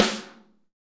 SN AMBIENT, Real Mega Drums; Best Service; Real Mega Drums VOL-1

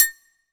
SR H AGOGO, Drum Mashines, SR-16 DRUMS; EdgeSounds

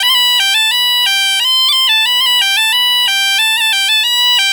EM BP, Celtic Flavours, BAGPIP090; USB Soundscan vol.42; AKAI

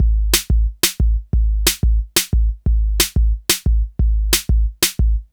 Kick Snare Loop

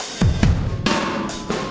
Landing Party Beat, Processed Drumkits

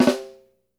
FLAM, SNARES; Sampleheads - New York City Drumworks VOL-1; SW SNARES

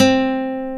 NYLON B 3, Bass Guitar; AKAI S6000 CD-ROM - Volume 1; NYLON GT

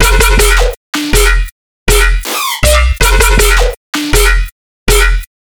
bass loop 160 E; Virtual Riot

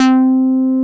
Synth Bass, Roland

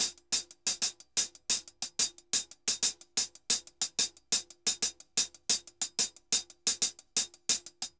HH, Festa Latina, Drums & Percussion; Best Service; Festa Latina VOL-1

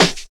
combi drums

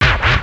DR-660 FX; Rubber Chicken Software Sample Bank

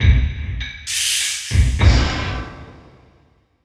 50 large loop, industrial loops

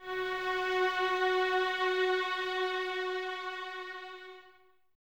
Violins F#4, String Ensembles; Miroslav Vitous

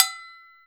pan, steel percussion; E-MU Producer Series Vol. 7 – Old World Instruments

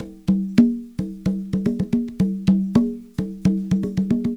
con; Percussion

